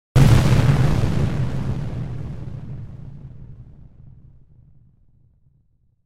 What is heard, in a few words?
explosion; 攻撃